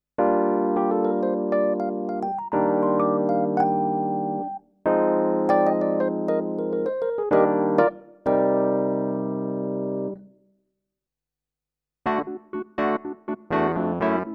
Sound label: Kawai ES7 E Piano